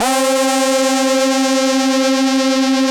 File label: 700 C 3, Korg; Back In Time Records; Korg Universe VOL-1